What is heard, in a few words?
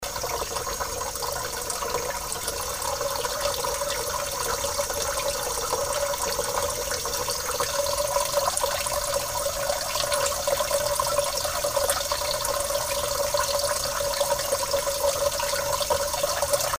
Getting Heavier, Plumbing